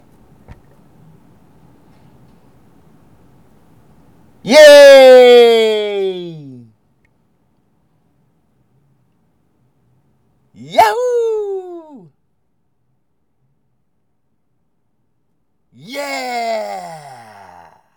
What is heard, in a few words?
Yay Yahoo Yeah